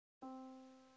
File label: yamaha Disk